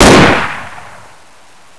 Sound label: ak74 fire; firearms